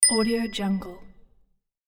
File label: bell ping